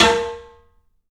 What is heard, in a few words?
SNAR, 3-D Percussives; E-MU Producer Series Vol. 5 – 3-D Audio Collection; P.A. Kit